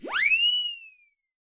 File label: flee